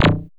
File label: MUSIQUE CLK; CYBER 1